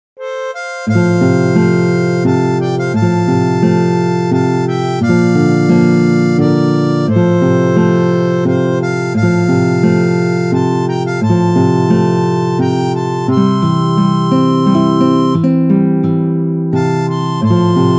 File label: Amazing Grace Harmonica